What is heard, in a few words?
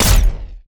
Sci misc Sniper Cannon